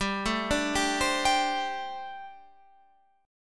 G Suspended 2nd Suspended 4th, Guitar, Gsus2sus4 chord; arpeggiated; 15,12,12,12,13,15